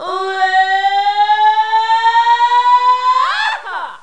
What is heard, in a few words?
SCREAM; Evap Demo